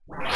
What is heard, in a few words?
delete; Active Worlds